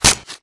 Spear Shell, Damage

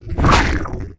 WAR ATTACK, Mob

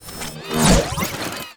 CUTTER WAR ATTACK; Mob

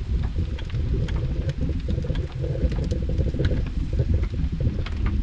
Lava Rumble Small, ambience

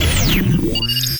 WAR DAMAGE, Mob; HOBOTURNPIKE

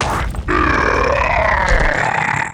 WAR DIE

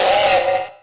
Damage; Blink; Mob

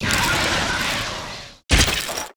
VOLCANIC GRUMBLE WAR DIE

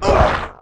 Trifead Damage

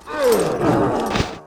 Metal Night die

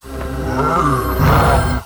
STONE BLOCK WAR POWERUP

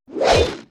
mace, Weapon

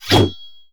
sword; Weapon

